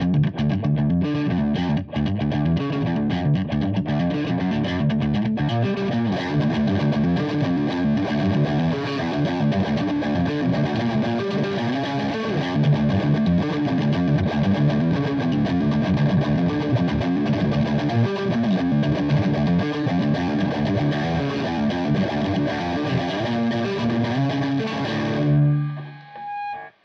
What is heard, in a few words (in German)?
Verzerrer Pedale Boutique; Walrus Audio Ages